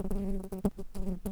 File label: Pascuorum workers, bumblebees